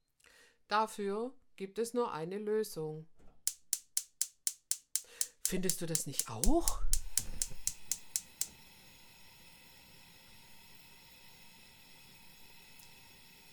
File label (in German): gasflamme